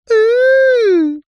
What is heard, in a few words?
human male say oooo as in replying to insult